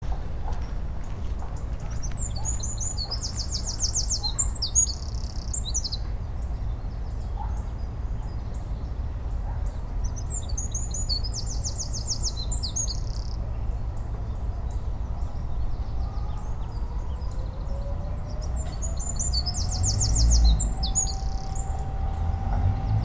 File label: Troglodytes troglodytes Eurasian Wren